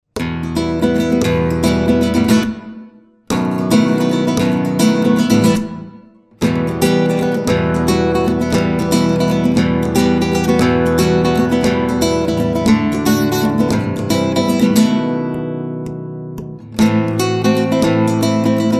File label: Guitar Chords Hitting Slow